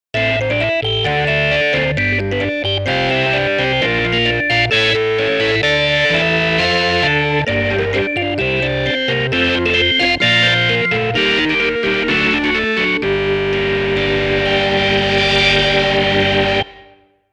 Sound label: Box FM Combo Organ; montage